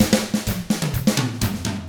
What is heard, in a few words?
127 Drums